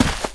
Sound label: steps; the monster plane; kolmods